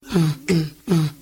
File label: "ooh ooh ooh", voice